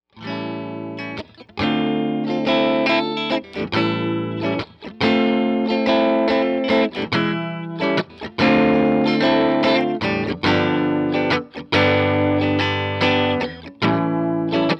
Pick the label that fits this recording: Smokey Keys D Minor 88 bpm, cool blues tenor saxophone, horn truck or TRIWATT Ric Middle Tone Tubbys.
TRIWATT Ric Middle Tone Tubbys